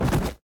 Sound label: dodge; smite; Ares